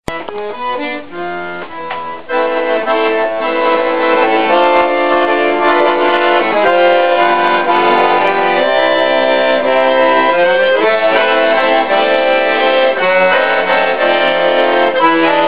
Acordeon a botones

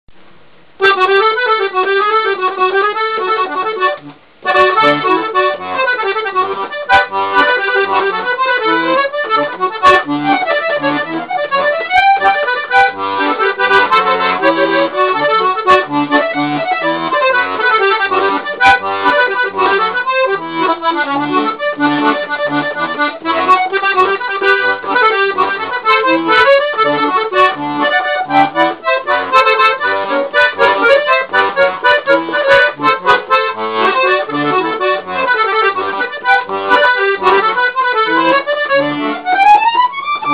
Acordeon Cueca

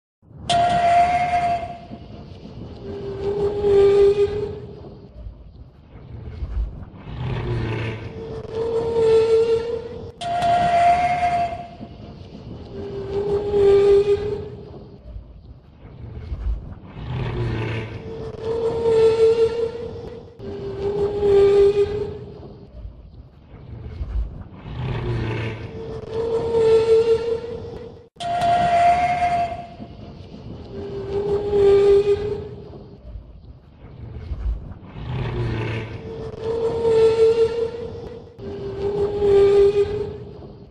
Sonido de la Ballena; sonidos cortos de animales

